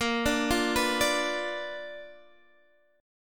B-Minor Major 7th Flat 5th Bb

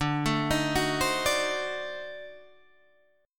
D 7th Flat 9th D down Guitar Open D

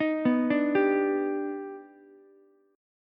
D sharp Augmented Major 7th D sharp Ukulele; arpeggiated; JGuitar

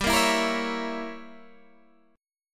G Diminished 7th, Guitar, Open G

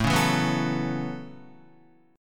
A 6th Add 9th A down Guitar; A6add9 strummed

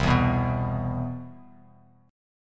C Major 7th, Bass Standard; CM7 strummed; JGuitar

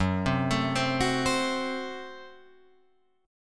Gb Diminished, down, Guitar, Open F; arpeggiated; JGuitar

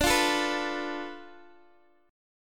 D Minor 6th Guitar Open G

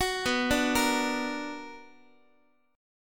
B Minor Major 7th, Guitar; arpeggiated; JGuitar

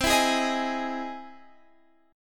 E Augmented Major 7th C

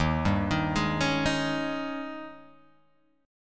Eb 11th, chord; arpeggiated; JGuitar

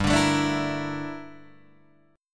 G Minor 6th Add 9th G down Guitar Drop D

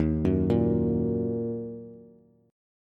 Eb Minor Eb Bass; Ebm arpeggiated; JGuitar

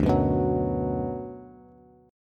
D 6th D down Bass, chord; D6 strummed; JGuitar

